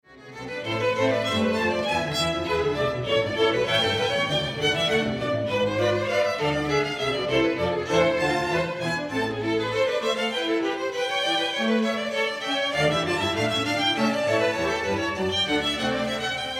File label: Double Concerto (2 violins)